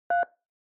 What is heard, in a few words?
Telephone DTMF, 3